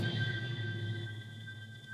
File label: camera shutter; sound effects